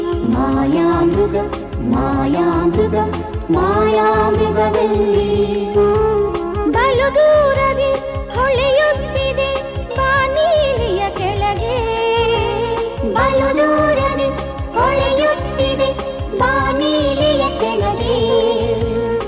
Title Song Ringtone; Mayamruga

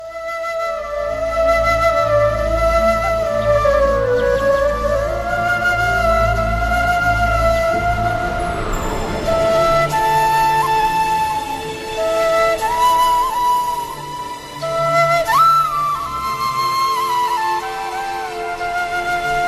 Radha Krishna Flute Ringtone; Serial Radha Krishna

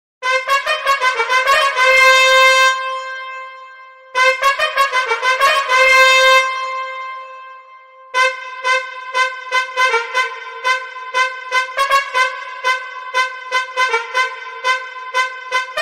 Remix DJ Music Ringtone; IPL 2020

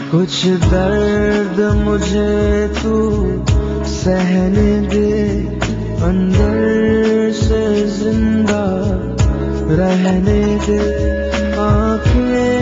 Sad Song Ringtone

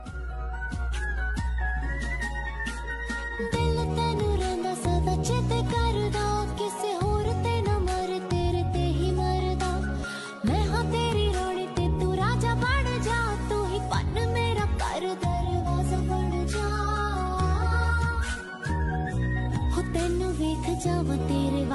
Female Version Ringtone

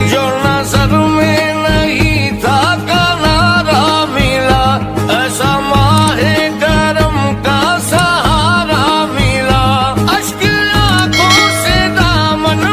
Best Naat Ringtone

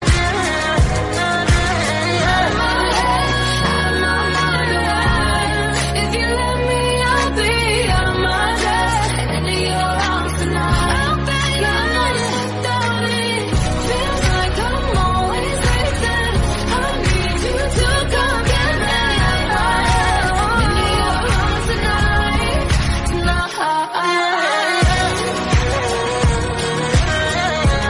Female Version Ringtone

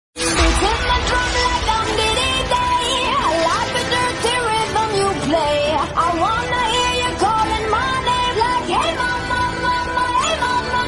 English Song

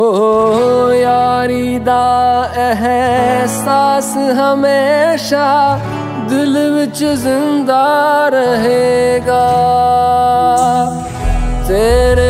New Punjabi Song Ringtone

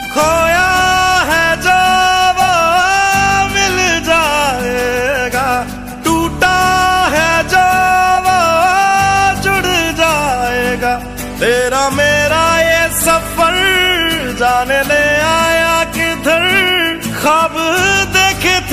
Hindi Song

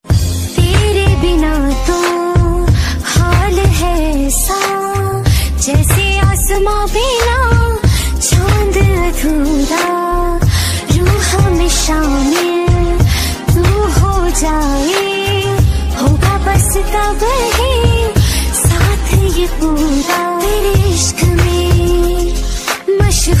Female Love Song Ringtone

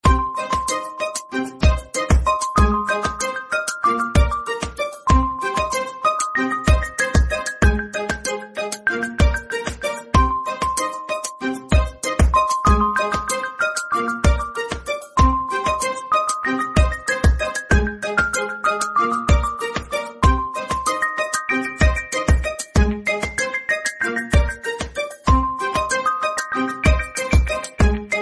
Htc Android Ringtone; ringtones

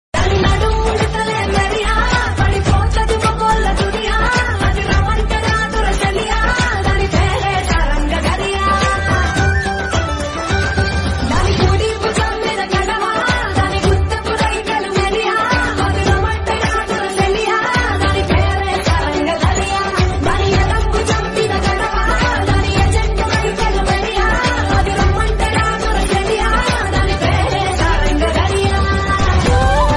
Telugu Song Ringtone

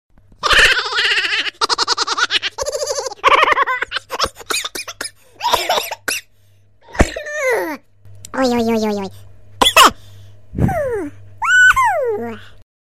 Funny Baby Ha Ha Ha Ha Ringtone

